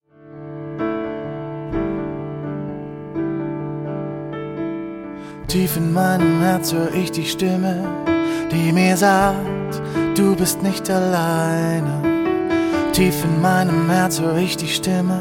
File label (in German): Akustik Version